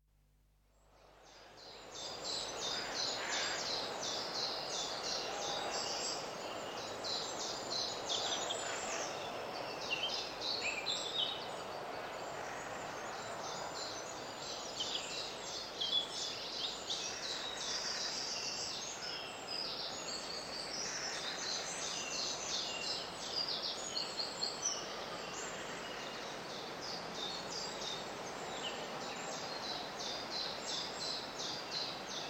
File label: Early summer mixed woodland atmosphere, early morning with chiffchaff, goldcrest, wren alarm, lambs, fox yapping; Outdoor Sound Effects; BBC